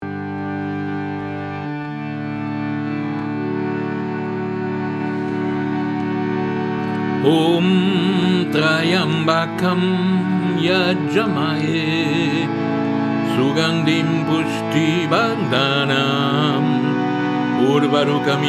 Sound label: closing mantras; om tryambakam